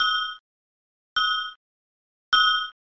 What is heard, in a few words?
Stereo Test; tinkle pit